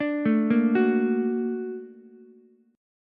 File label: A sharp Augmented Major 7th A sharp Ukulele; arpeggiated; JGuitar